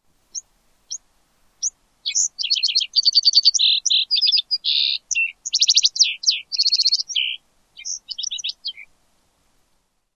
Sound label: flight song